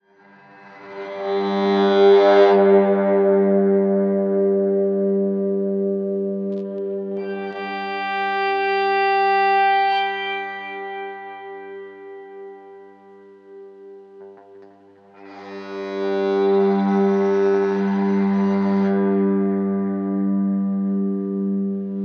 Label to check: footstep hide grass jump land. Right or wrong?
Wrong